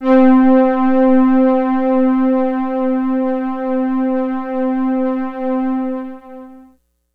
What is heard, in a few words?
Prophet 600 LEAD Aged - C; One Shots; Cymatics; Fantasy Synth Sample Pack